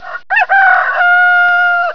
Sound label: bird rooster crowing; creatures; birds; mono